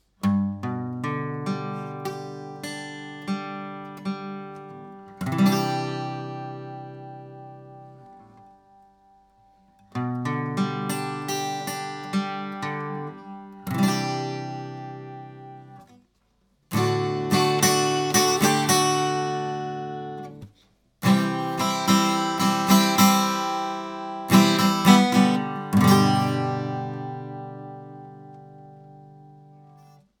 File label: Guitar; AEA TRP3; Lewitt LCT640TS